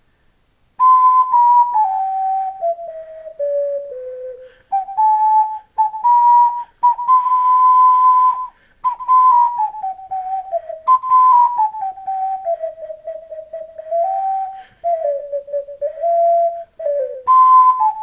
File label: ocarina